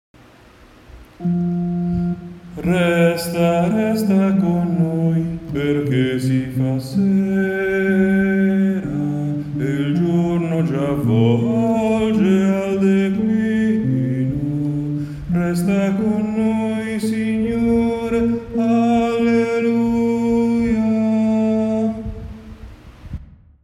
Resta Con Noi Signore Uomini

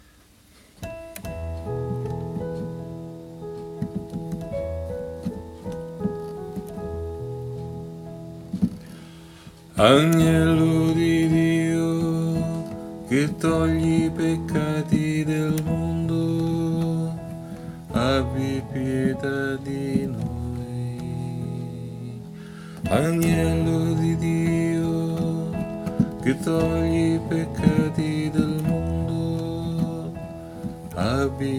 Agnello Di Dio Uomini

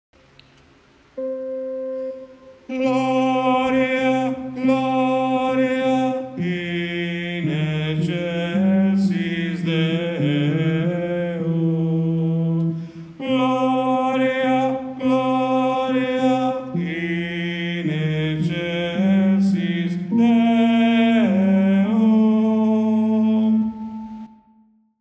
Soprani; Gloria Lourdes 2013

